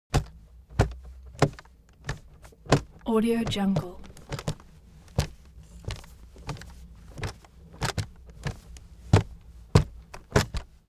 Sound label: object case aluminium walk; smartsoundfx